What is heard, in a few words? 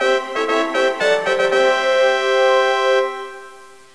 FANFARE; Civilization II; Civ II 68K Demo